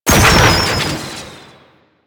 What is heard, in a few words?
Mutalist Proj Fire; Warframe; Proto Infested